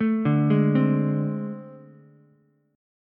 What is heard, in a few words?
A Minor Major 7th Double Flat 5th, down, Ukulele; arpeggiated; JGuitar